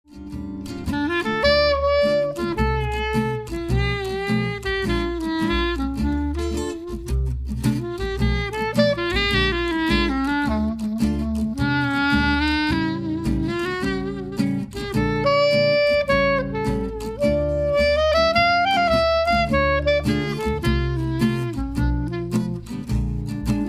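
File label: clarinet, jazz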